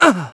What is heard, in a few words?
hurt; GrandFantasia